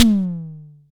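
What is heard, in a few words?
SDS TM, tom; Best Service; Real Mega Drums VOL-1; SDS V KIT 2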